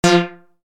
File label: Steeldrums